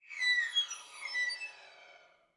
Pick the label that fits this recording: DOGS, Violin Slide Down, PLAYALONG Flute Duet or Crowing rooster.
Violin Slide Down